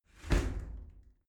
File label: shower slide door close hit regular